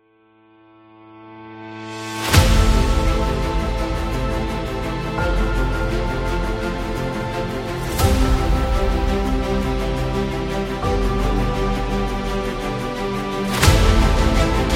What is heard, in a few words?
Motivated